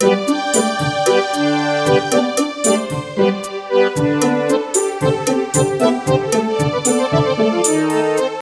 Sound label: Musit; MOBICLIC 14; europe